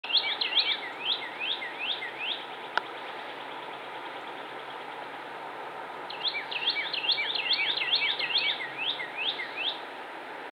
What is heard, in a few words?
Tea kettle v. cheery